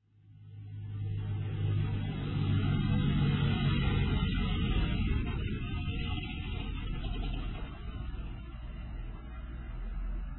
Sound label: Leopard